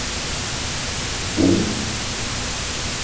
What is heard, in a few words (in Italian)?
Colpo